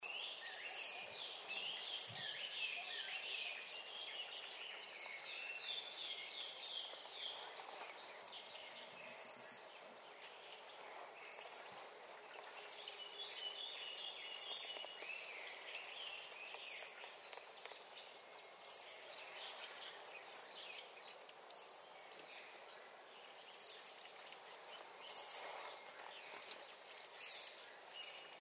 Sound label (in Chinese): 黃眉柳鶯; 柳鶯科/過境鳥、冬候鳥